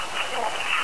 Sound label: DEATH